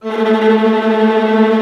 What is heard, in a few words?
Viola A2; Digital Sound Factory Virtuoso